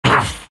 big pain; Undying

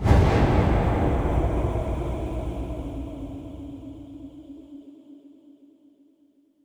destroy; weapons; ofrenda; dota; muerta

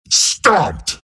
hoof stomp; dota; centaur

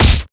idle fight headbutt; idles; ogremagi; dota

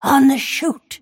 move pain; snapfire

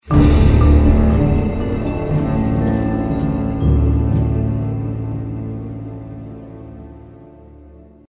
music stinger; dota2

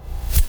psi blade; templar assassin

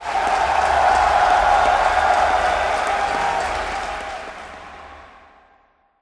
cheer; radiant; dota; spectator

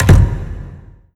gem drop; ui

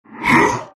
shards grunt; terrorblade

